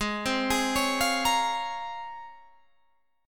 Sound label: C sharp Major 13th, chord